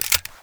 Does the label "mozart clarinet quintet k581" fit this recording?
No